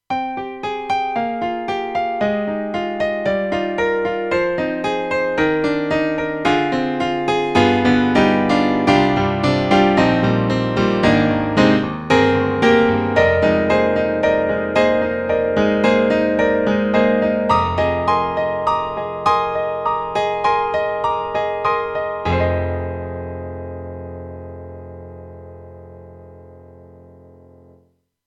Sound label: Piano; KORG; AG-10